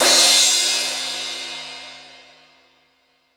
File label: Metal Drums, E-MU Pro-Cussion